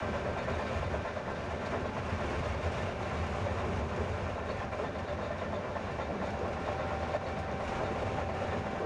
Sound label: Run, Vehicles; GT6M